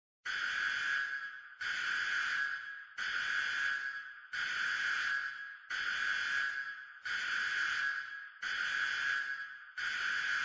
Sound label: Alarm; SCP Secret Lab